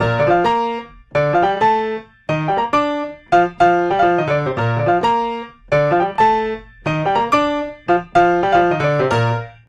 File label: ringtone